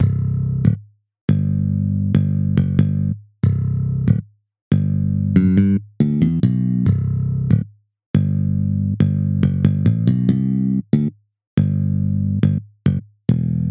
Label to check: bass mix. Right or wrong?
Right